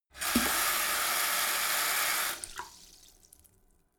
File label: sink fill splatter hard short; haus-garten; badezimmer